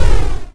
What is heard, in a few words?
Explosion; cirnogodot